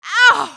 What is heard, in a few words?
girls, pain